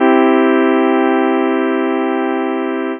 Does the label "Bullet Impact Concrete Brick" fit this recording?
No